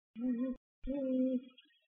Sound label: nervous